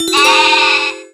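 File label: Battle Skill; Etrian Odyssey III HD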